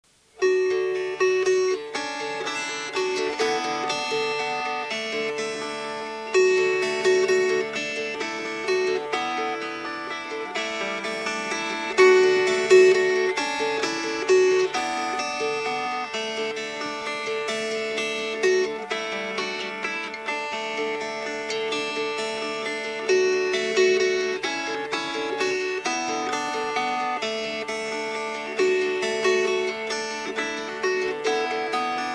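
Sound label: Fingerpicked, Dulcimer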